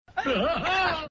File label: hu-hoa